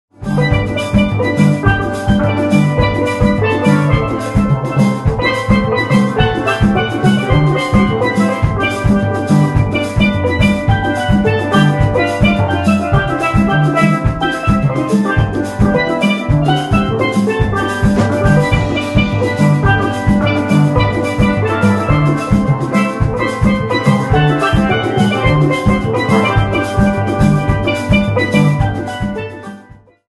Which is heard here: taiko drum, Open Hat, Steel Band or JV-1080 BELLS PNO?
Steel Band